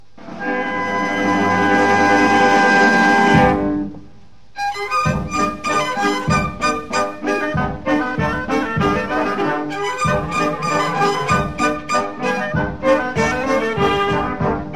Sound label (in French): Anglaise - Belgique; Anglaise de Jean-Guillaume